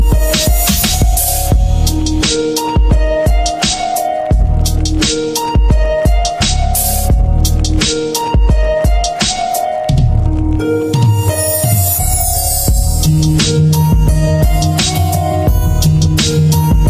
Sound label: gangsta beat; hip hop